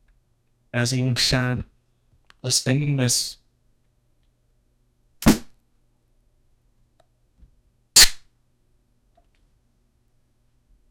someone taking a shit, bathroom

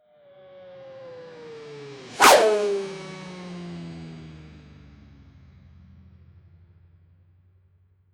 whoosh sound of speed

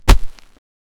gore

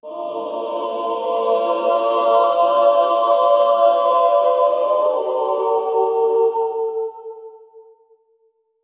choral agonized pleading, choir

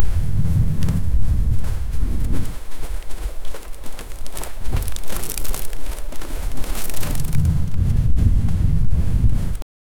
bags

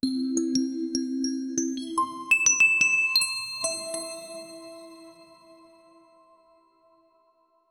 notifications chime synth

